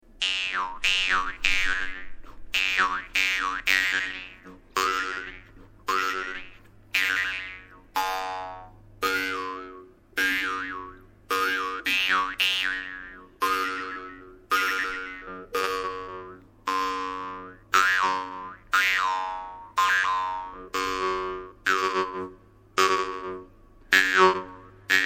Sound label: russian vargan small; ustinov